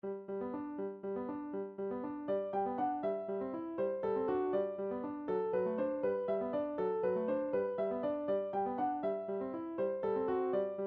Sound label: serenade in g major, midi; hiller